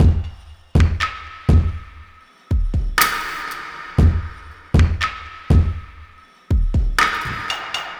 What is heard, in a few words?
120, drum loop; FOREST STONE; stonemaker